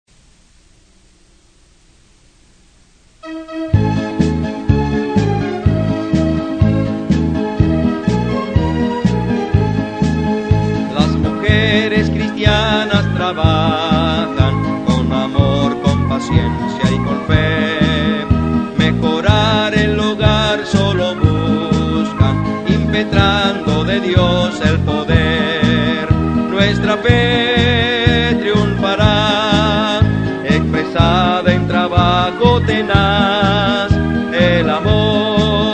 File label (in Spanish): Las mujeres cristianas trabajan melodia palabras